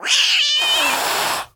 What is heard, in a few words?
Black Cat